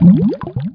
bubbles; CD Action; outlaws

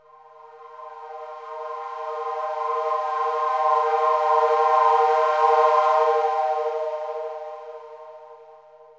SYNTH PAD AMBIENT 160 C, Ambient; eJay; Sphere

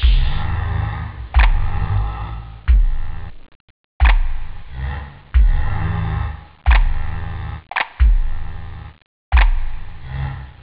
hmmm, house; OctaMED SoundStudio V1

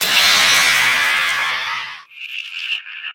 spider death; darwinia demo

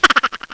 laugh

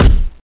fist hit; Siege+THF; weapons

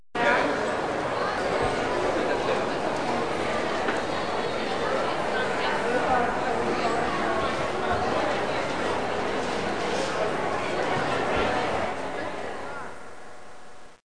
MALL

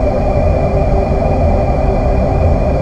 engine; Earth and Beyond; EA Games

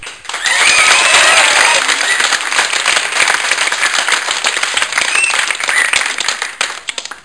Applause and Whistles, Young

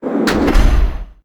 gates close; Geschicklichkeit; Bricks Of Egypt

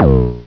Bug Charge; Grid Warrior 1.2.6 Demo

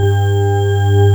analog

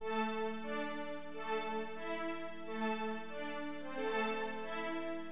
STRING, HipHop, Keyboards; LiveAct Pool 1

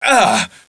pain, player

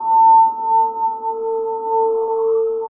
Voice; Revista CD Expert 50; PCGamer50

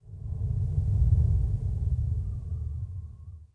WIND, wind; zones

